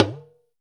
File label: TALK DR, TALKING DRUM, Africa